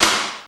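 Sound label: processed snare, drums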